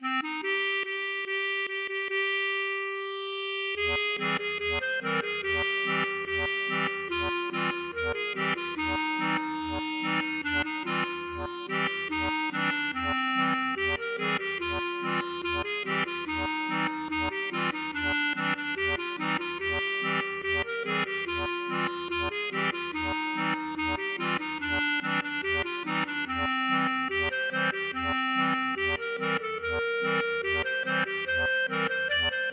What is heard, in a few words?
klezmer